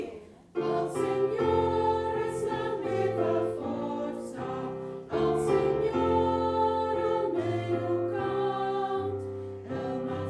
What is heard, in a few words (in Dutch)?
sopraan; Taize; SINT-CECILIA KOOR ROTSELAAR